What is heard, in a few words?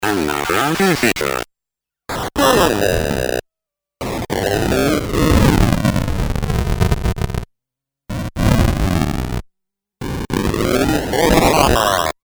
geiger, Commodore64; speech; Impossible mission; Commodore64 speech pack variations